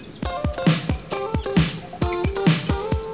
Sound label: riff